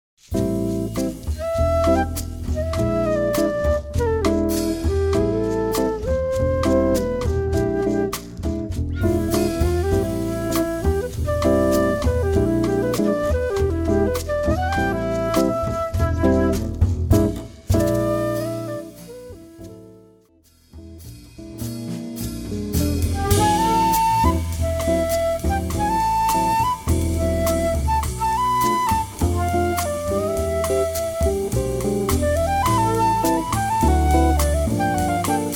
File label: Gentle Rain